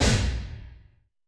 Door Slam, Alesis D4

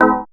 organ, c, Garage Organ; Dance Synths

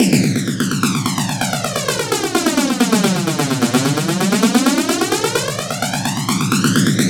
DRUM FL; Optical Media International; Sonic Images Library; SI FX Vol 9; Gated FX

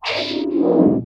CYCLOTRON; Distored Reality

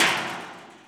Dumpster, Drum Machines Alesis D4

